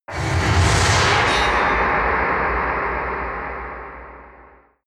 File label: whoosh industrial heavy